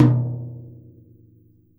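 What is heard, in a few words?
Jazz kit tom, Drums Acoustic; AKAI; JAZZKIT 1; 90s sample CD; USB Soundscan vol.10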